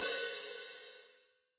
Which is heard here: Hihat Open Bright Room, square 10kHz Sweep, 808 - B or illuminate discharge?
Hihat Open Bright Room